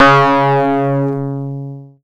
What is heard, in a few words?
C#, Synclav FM PLUCKED; Big Fish Audio